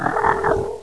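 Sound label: die, alien controller; baby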